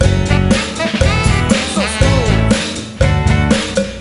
Cowbell; Happy Feet Two; NDS

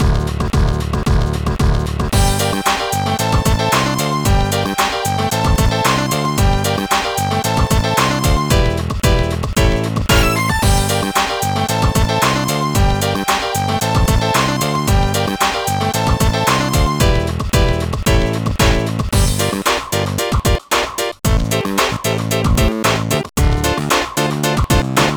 Hip-Hop Stage Karaoke; pinky street kira kira music night pop town gamerip

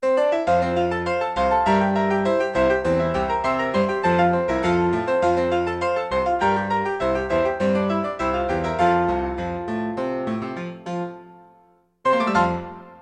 Classical Music; Nancy Drew: Danger by Design; Windows gamerip 2006

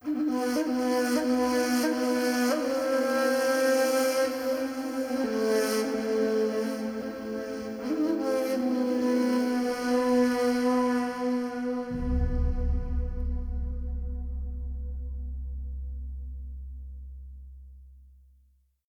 Flute; Age of Conan Hyborian Adventures; Kheshatta Flute

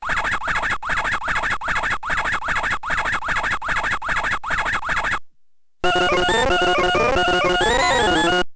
Startup; ASCII Pac-Man DS; DS homebrew

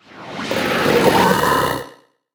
grab

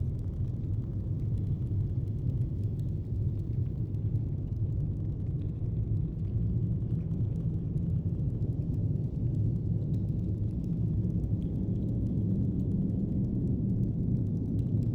amb grub deep

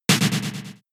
In Battle Recall Switch Flee Run; pokemon sfx gen 6 attack moves xy oras

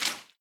water footstep

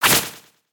axe

